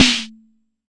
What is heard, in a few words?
Jomox MBrane, drum machines; Jomox MBrane 11